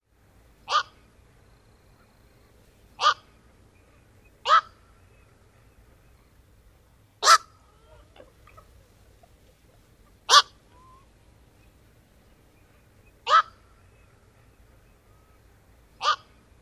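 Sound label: Black-crowned Night-Heron wok flight calls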